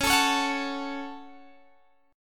C sharp Suspended 4th Sharp 5th C sharp down Guitar Standard; strummed; JGuitar